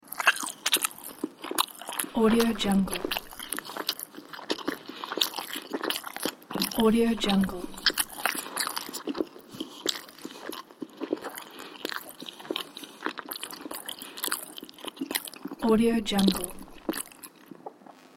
food chewing

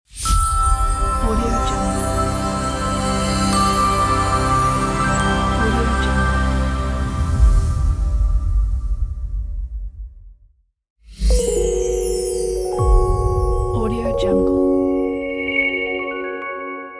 brand logo intro pack; kirillluzin

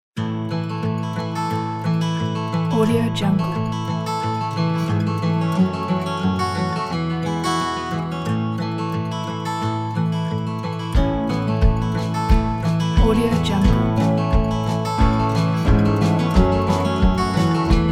relaxing summer days

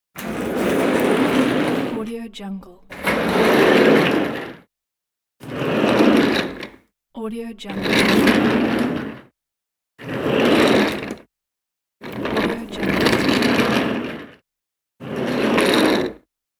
office chair rolling sounds

